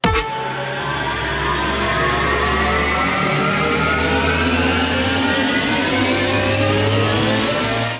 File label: stop bonus; Amatic; Book of Pharao